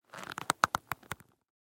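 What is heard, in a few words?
baseball sounds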